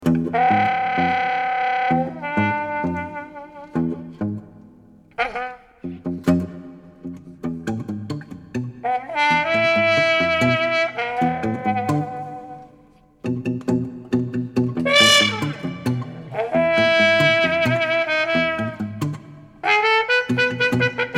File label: Tango